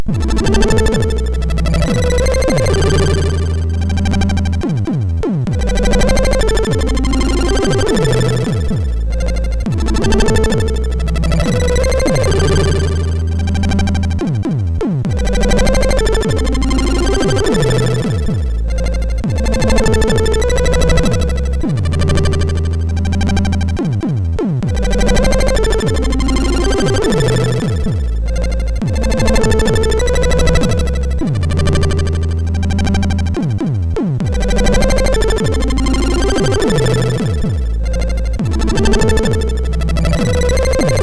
Organic Toy note, MOS6581R3

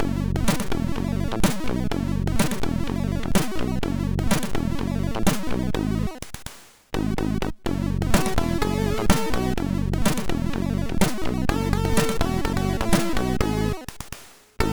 Weird Tune, sid MOS6581R4

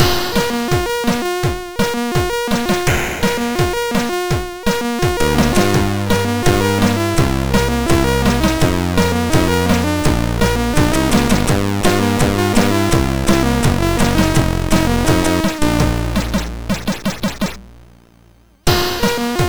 Intro Tune, MOS6581R4

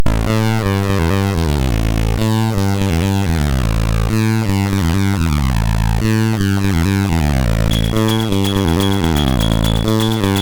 Drum Beat sid MOS6581R3; hvsc

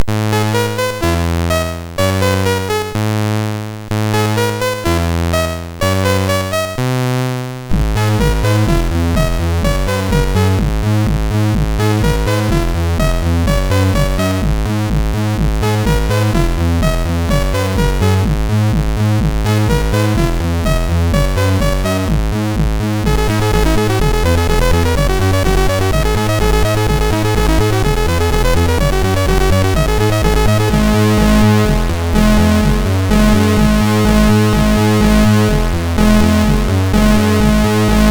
Sorrow, MOS6581R4

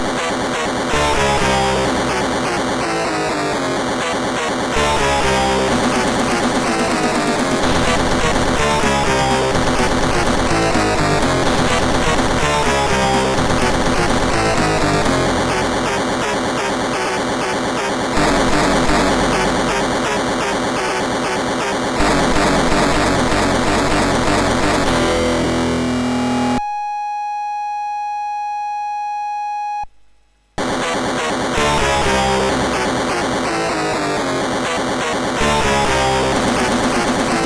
Metallic, sid MOS6581R3

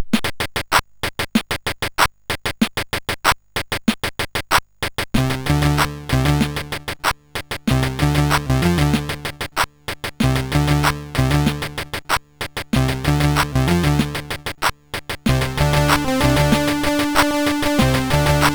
Digi, MOS6581R2; New Digi 8

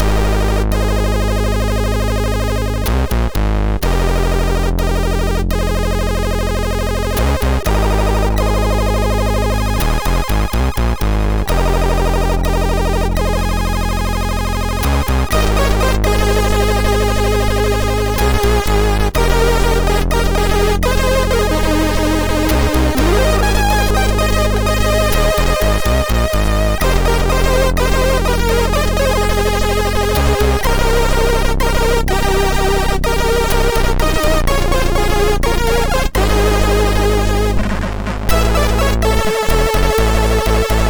Heartbeat Intro, MOS6581R2

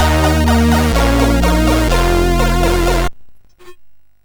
Tube Madness, MOS6581R4

